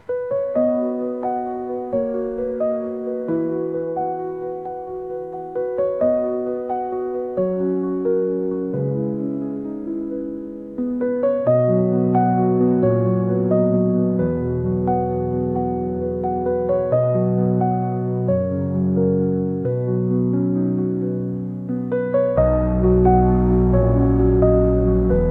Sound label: Piano